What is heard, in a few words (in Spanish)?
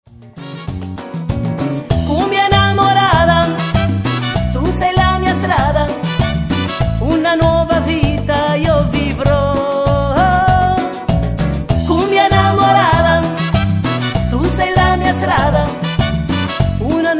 cumbia negra cumbia enamorada vers italiano